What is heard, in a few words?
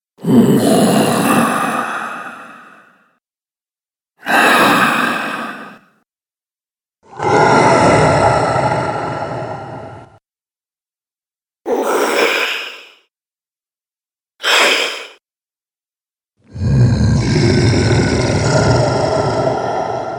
Monster Breath, Scary Breathing